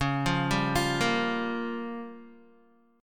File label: Db 6th Flat 5th Db down Guitar; Db6b5 arpeggiated; JGuitar